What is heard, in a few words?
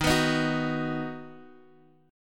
Eb Minor Sharp 5th Guitar Half Step Down